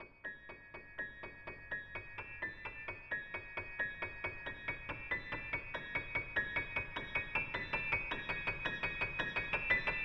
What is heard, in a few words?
Waltz; No.1 (Weird Rain)